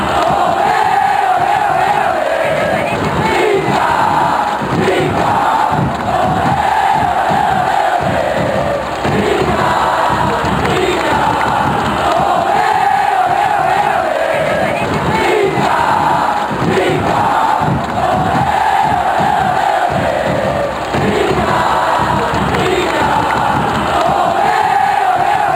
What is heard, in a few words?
ole ole ole, chants; cruz